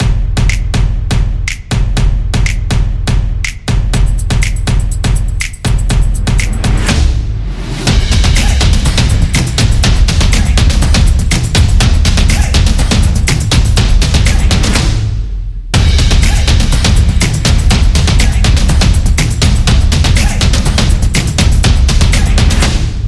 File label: Percussion Upbeat Beat; samba